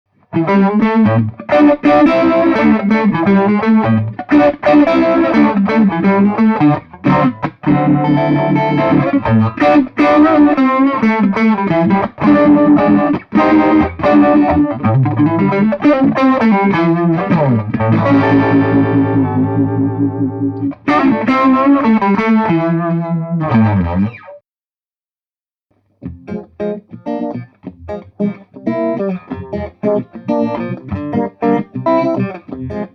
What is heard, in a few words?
vibe